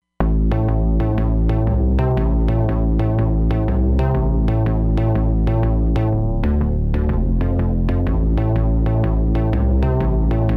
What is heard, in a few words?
Dust Bass, Roland Fantom XR